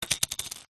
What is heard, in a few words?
lesiure toy ballbearing plastic maze set down on wood surface